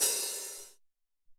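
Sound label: Open Hat, Alesis SR-18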